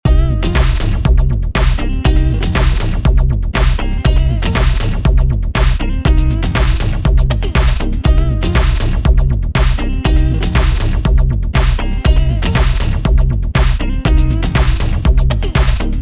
Sub Groove with drums

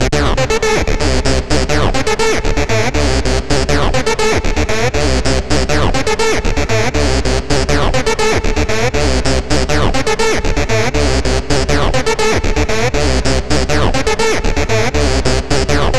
Trance

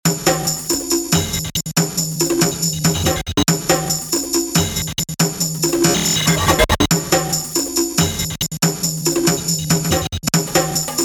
Detroit Percussion; Preset used Rhythm Breaker

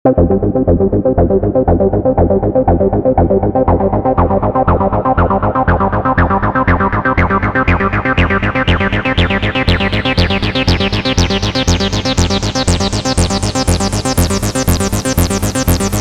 Joy Arp

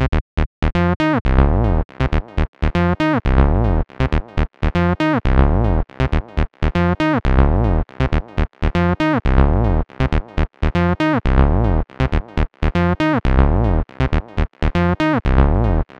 Klassik Acid

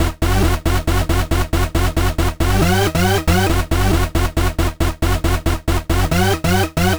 Vanilla Hoover

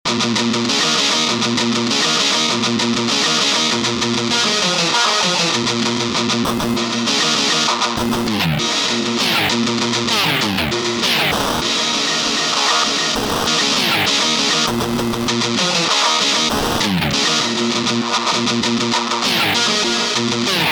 Glitch Guitar Preset Down Pitcher

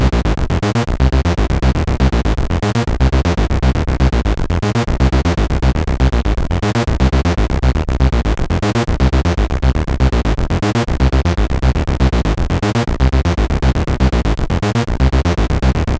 Techno Synth

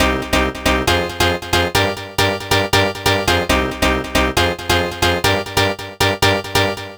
Rave Piano Stab

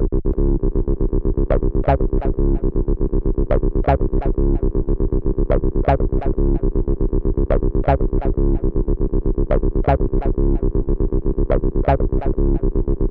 Turkish Phase

